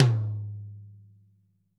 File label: Toms; Bob Clearmountain Drums I